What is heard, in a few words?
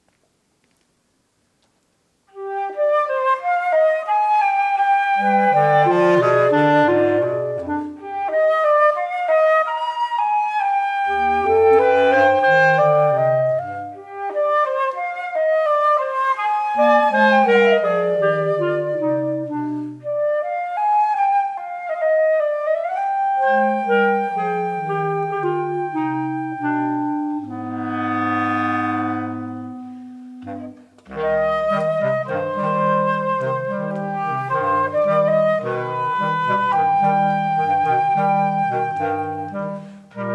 Bossa Nova